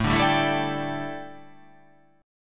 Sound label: A 7th Suspended 4th, A, down